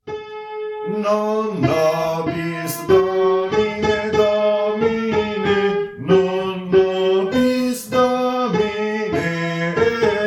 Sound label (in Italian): Non nobis tenori primi